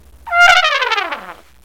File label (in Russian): truba